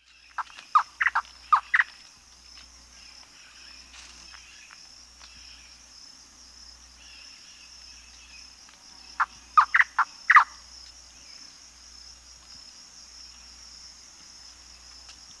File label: Manu Antbird (Cercomacra manu), Bolivia, Birds of the Amazon; S. America